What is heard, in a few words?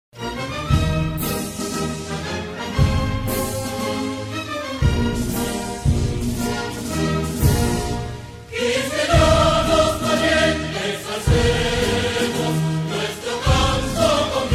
National Anthem of Dominican Republic; Himno Nacional Dominicano